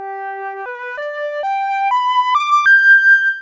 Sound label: flute; Spectrum Interpolation Synthesis for the Compression of Musical Signals